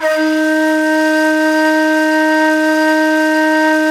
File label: NYE FLUTE; Nye Flutes